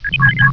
key; svencoop; quake1

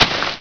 grass, player; mistake coop

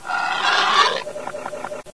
die, bullchicken; mistake coop

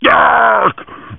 pain; svencoop; uboa; ichyhakase

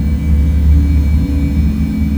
drone loop, factory sounds; svencoop; mustard

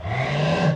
gonome moan; svencoop; lostmaze

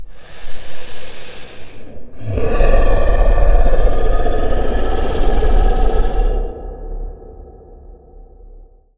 growl; svencoop; mustard

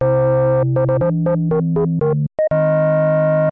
bgm start

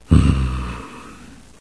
gman huff; svencoop; echoes

